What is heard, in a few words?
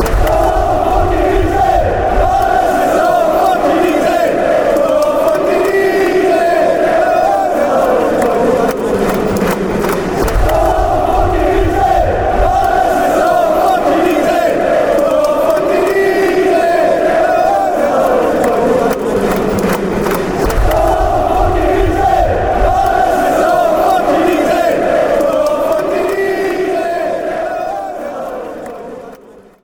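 fanchants, football chants; easy easy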